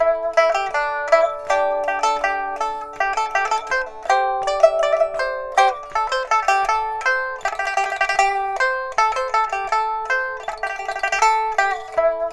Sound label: PIPA, 90; INIS - Opium